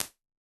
2000 SN SHORT, Impulse Responses; Reverbs; PastToFutureReverbs; SONY; CLA; IRs

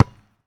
Street Ball Bounce Dirt, Street Basketball

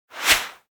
whoosh swish; NCH Library

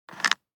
DICE on Cardboard, Grab, Standard, Five Dice; Samples; ArticulatedSounds; DICE Sfx Party (Extended)

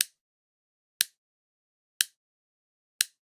Generic Click Plastic Tiny; boom library; The Interface

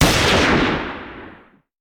Hand Gun; Sound Ideas; Guns

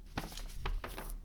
papers fold; Apotheorasis

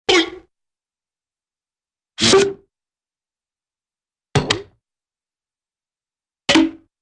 Vocal Boink - Quick Air Release - Bounce - Plunk; Cartoon; Hollywood Edge; Cartoon Trax

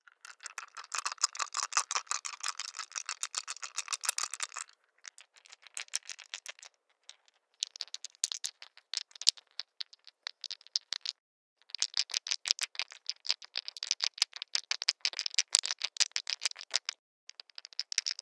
dice shake; dice, cards, game related; NS Studios - ultra sound collection

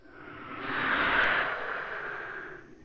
move; Apotheorasis

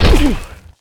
Football Hit Tackle

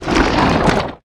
Plant Giant Stalk Sink; SFX Kit; Foley - Misc

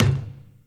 Basketball Backboard

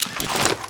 Gun Holster Weapon, Weapons Foley; SFX Kit

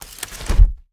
Umbrella Open; Miscellaneous; 1001 Sound Effects

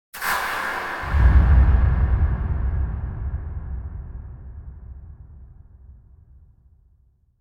Impact Distant Multi Hit - Sharp And Deep; Hollywood Edge; sound designer's toolkit v2

